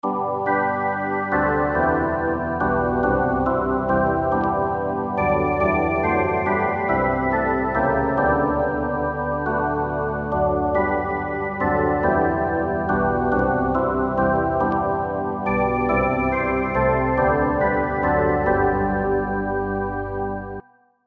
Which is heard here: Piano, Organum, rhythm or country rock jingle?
Organum